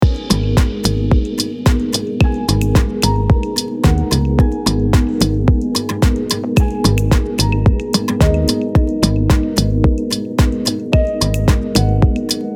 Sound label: 110BPM